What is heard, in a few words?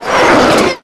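Collision Interior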